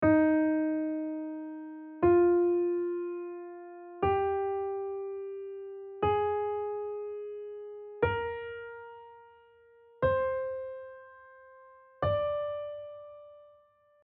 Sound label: Eb Major Scale